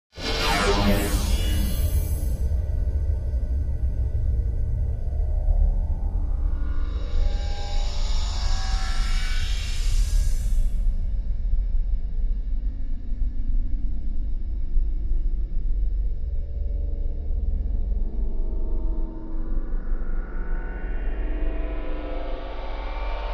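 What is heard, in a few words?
Stab Elongated Electronic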